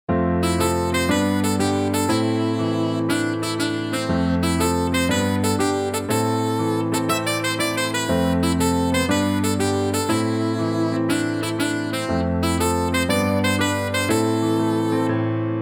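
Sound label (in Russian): успокаивающий ритм саксофона открывающий фоновый звук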